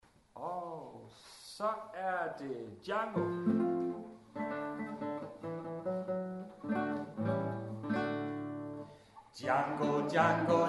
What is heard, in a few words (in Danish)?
Sang